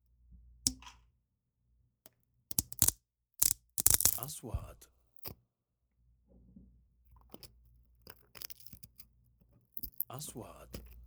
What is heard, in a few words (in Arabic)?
كسر خشب; sound effect